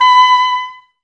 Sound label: OBOES B, Oboes, Wood Winds; Miroslav Vitous - Woodwind Brass Ensemble